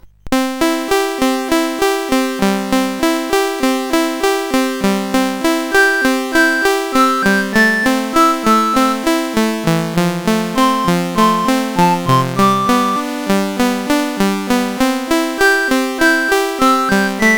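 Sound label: Folk Song, MOS 8580 R5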